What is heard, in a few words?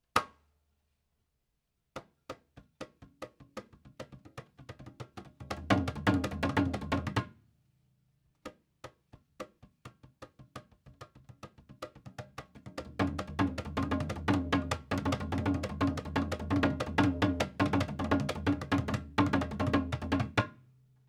jauk drum